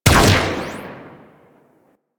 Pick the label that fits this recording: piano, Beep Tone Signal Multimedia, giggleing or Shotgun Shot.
Shotgun Shot